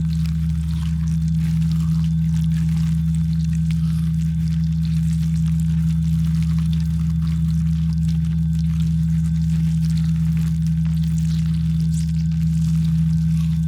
ant ship science idle; biogenesis

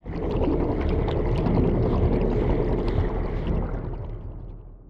swimming; astral planes; rift event

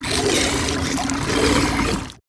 swarm generic idle; Stellaris; other entities

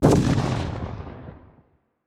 kaiju lightning hit lightning crack; Stellaris; biogenesis; weapons

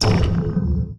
disruptor hit, weapons; Stellaris

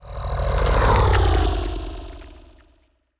pro ship weaver move; biogenesis

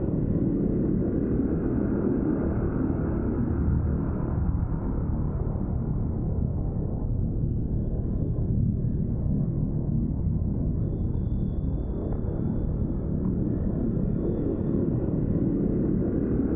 ship starbase idle; Stellaris; machineage

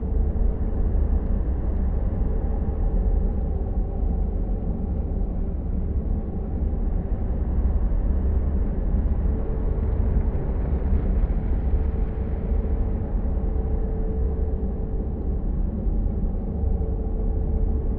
ship science ship idle; aquatics

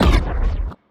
anti fire rate laser hit, weapons; biogenesis

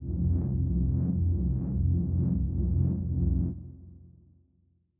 kaiju superweapon energy pulse; biogenesis

